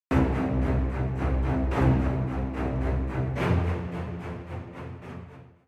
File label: suspence strings